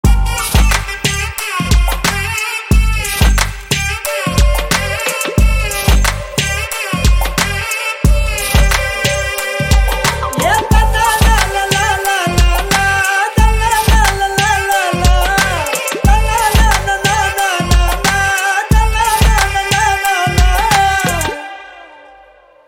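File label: humming background music tone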